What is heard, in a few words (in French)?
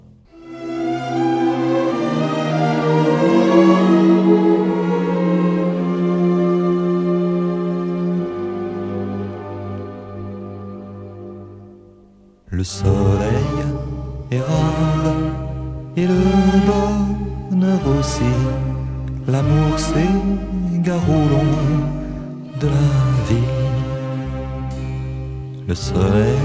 Valse